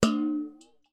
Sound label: impact metal bowl water wobble